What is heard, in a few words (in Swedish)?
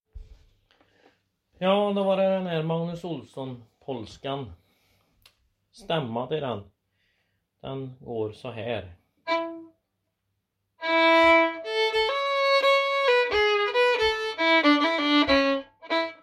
Polska, Arvika, 2a-stamma, langsam; Polska 2 efter Magnus Olsson